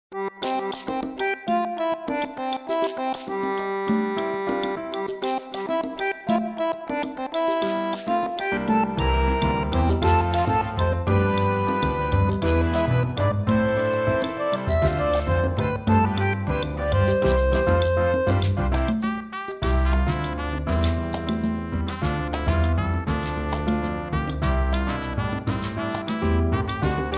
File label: Instrumental